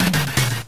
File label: Shadow Kirby Jingle; KaTAM